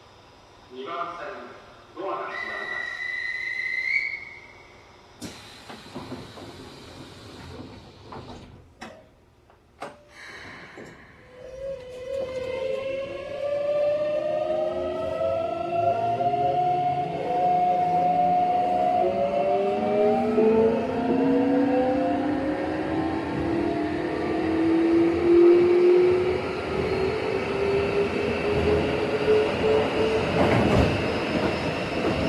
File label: vvvf, mitsubishi; keikyu 600